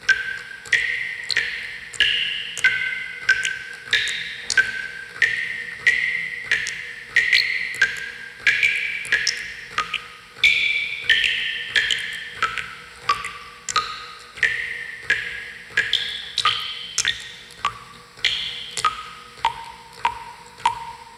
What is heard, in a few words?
Water Dripping Amb Loop; moul-assets